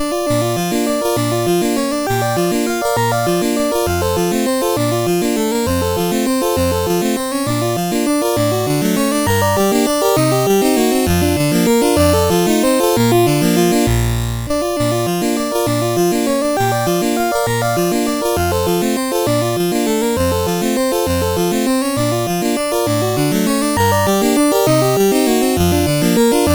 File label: Allegro in B Flat, CSG8580; CSG8580R5